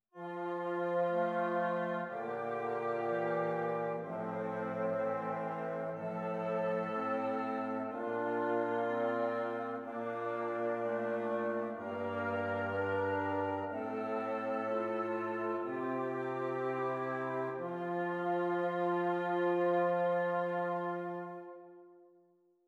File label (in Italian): Esercizi di contrappunto a tre voci; Zweite Gattung; Ronge pagina 62